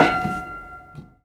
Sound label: Prepared Piano; Battery 3 Sample Kits